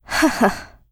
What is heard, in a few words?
laugh; Eternal Return; Katja; ENG